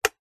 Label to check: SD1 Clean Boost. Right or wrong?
Wrong